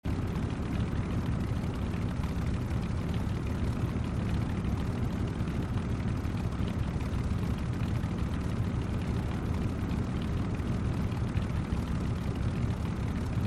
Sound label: idle, vehicles cars; lwcars